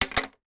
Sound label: office plastic maths protractor place down on table